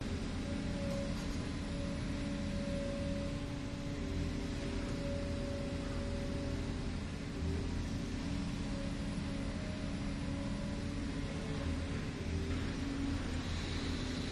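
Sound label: excavators construction site idles; your free sounds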